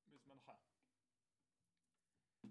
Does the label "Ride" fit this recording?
Yes